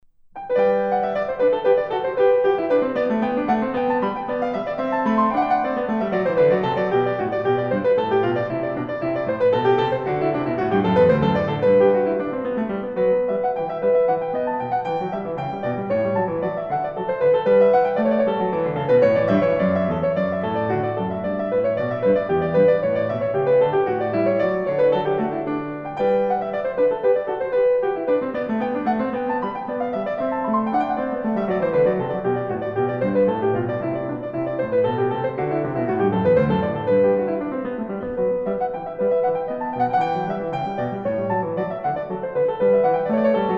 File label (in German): franzosiche suite n5 g dur bwv 816 courante